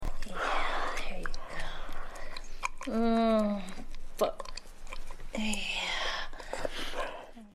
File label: lick